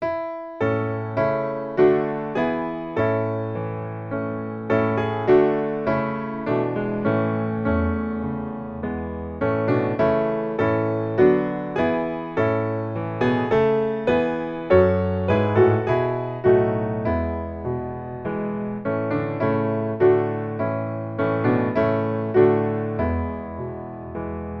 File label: piano